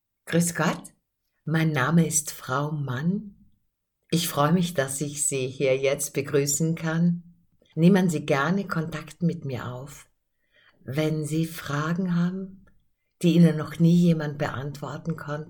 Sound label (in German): Begrüßungstext m song